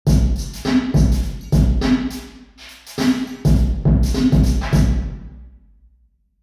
drum; dixon studio theatre; university york

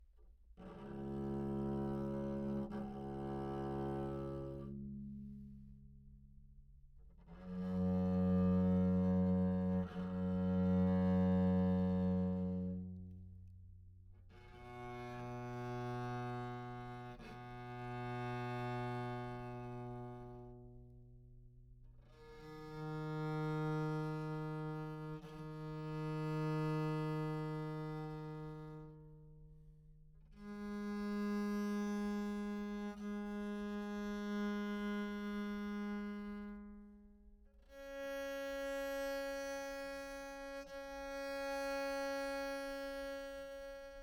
open, bass viol, Anechoic; OPENAIR

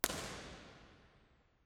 baptist nashville balcony; 1st baptist nashville

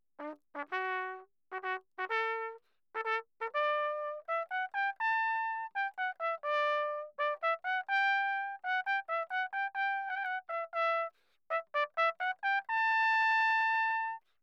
anechoic handel trumpet; 1888; OPENAIR

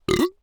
burp; OPENAIR; Anechoic

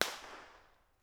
creswell crags; b-format

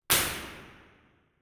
binaural, sound sculpture; tvisongur; iceland model

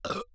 burp; OPENAIR; Anechoic

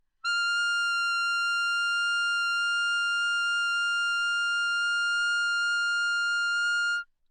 high, romantic clarinet bb, Anechoic; OPENAIR

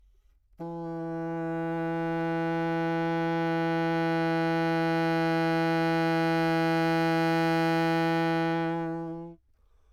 baroque bassoon, anechoic; OPENAIR

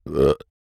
burp; OPENAIR; Anechoic

